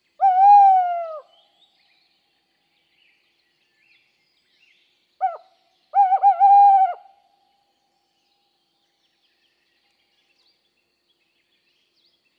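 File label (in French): chouette hulotte, Rapaces nocturnes; Rapaces , diurnes ou nocturnes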